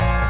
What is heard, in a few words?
PIAN TRIO; Canon CameraWindow